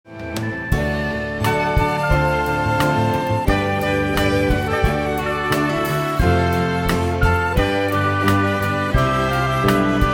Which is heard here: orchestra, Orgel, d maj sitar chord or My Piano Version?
orchestra